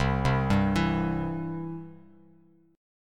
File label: C Major, Bass Standard; arpeggiated; JGuitar